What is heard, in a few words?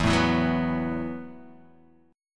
E Suspended 2nd Suspended 4th E, down, Guitar, Half Step Down